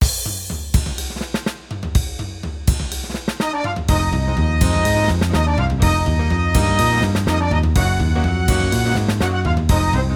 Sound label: Suspense Theme